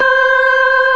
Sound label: ORGAN, NO PERC